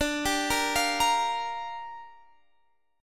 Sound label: G Minor 7th, D